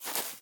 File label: Player Walk footsteps grass Walk